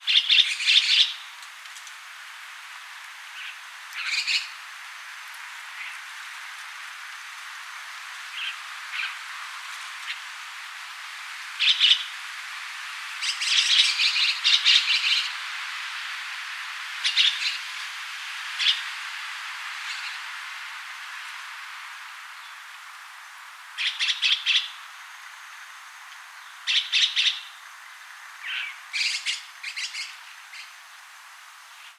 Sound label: Sturnus philippensis